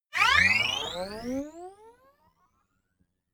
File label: notification message take off; kommunikation; signale